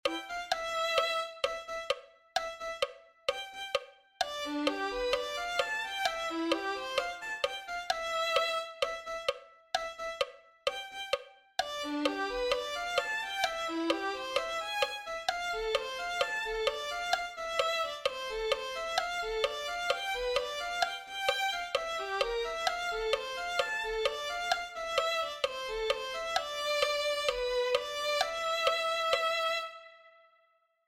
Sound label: violin